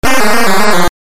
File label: Kill; The Texas Chain Saw Massacre